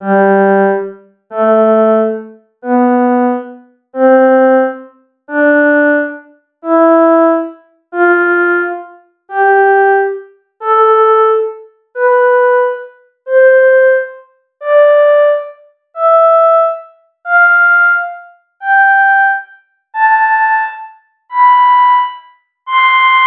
violin

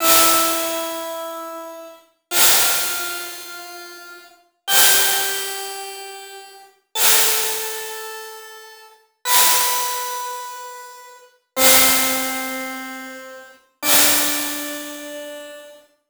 crash

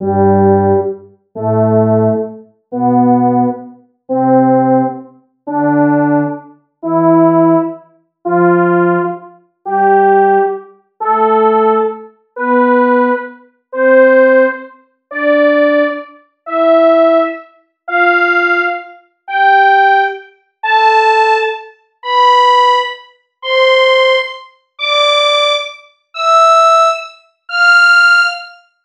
brass section

